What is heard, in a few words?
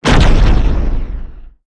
exp medium generic